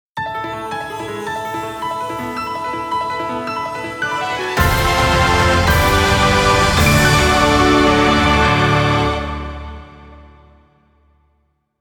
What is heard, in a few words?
Jingle Achievement